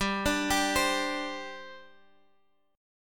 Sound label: G Suspended 4th, Guitar, Half Step Down